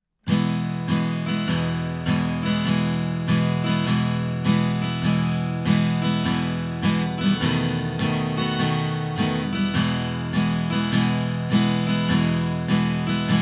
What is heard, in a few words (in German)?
Swing Gitarrenbegleitung; Careless Love